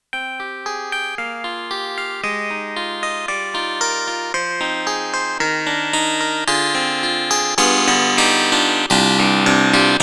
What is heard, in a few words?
YAMAHA MU1000EX, Clavi